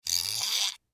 Comb Teeth Run, Comb Teeth Run Finger Along Teeth Fast; Primping; foley; Blastwave